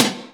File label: rock, Ticks; Misc Percussion